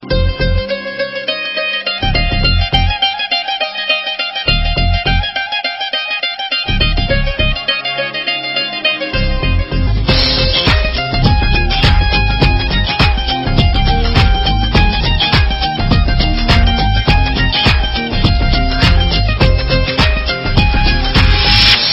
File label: guitar ringtones